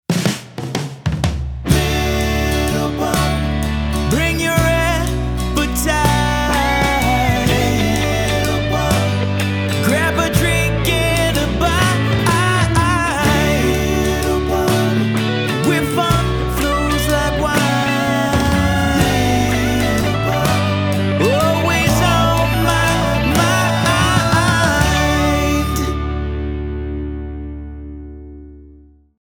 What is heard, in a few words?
Jingle, Power Ballad